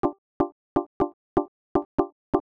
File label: staccato